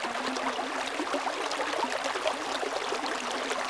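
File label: water flow loop; water splashes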